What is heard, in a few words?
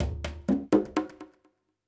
PERCUSSN ETHNO 125, Drum